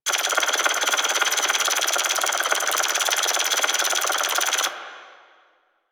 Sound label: Freeze Ray Mech Loop, Weapons; Warframe; Corpus